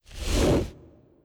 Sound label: Golem Ice